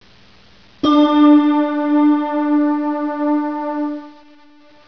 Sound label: synth